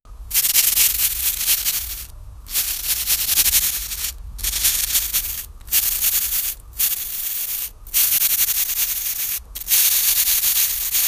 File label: record scratches, dj